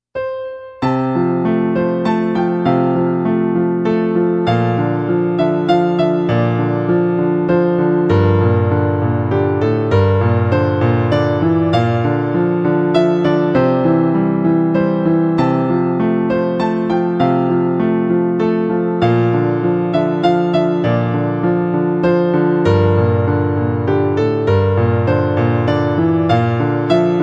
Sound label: short waltz